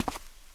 concrete; firefight

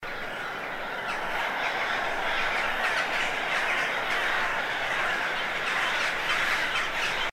Lonely Manor Upstairs; Cutscene

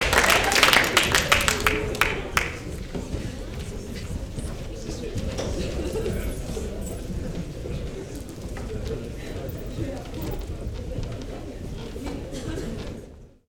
crowd leaves room, crowds interior; best service Blue Box

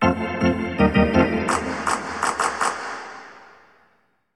Hockey Organ

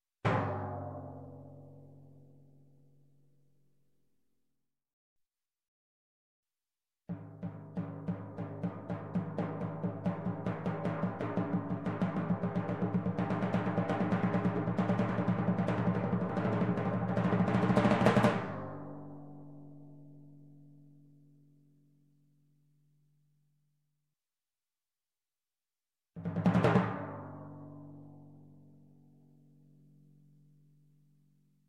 Timpani roll, low Hit, Music, Percussion, Drums; Sound Ideas; Series 2000

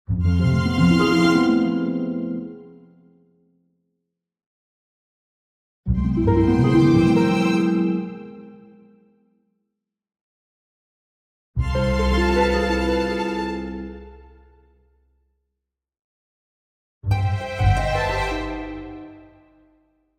Orchestral Jingle Negative; boom library; The Interface

